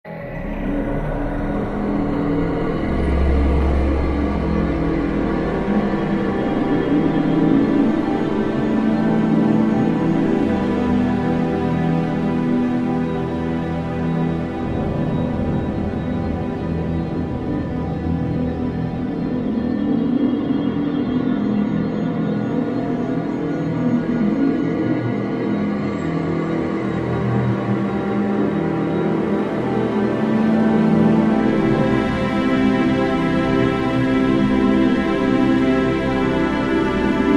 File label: music anticipation light; Ioncross Total War